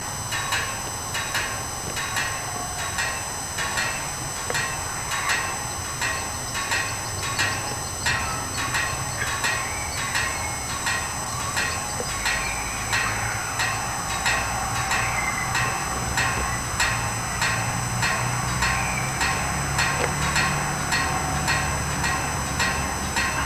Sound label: toy windmill